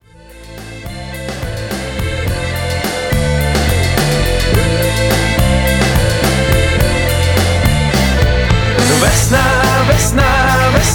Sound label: music